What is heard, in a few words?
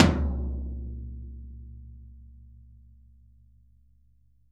Interactive Drum Kits; Sonic Reality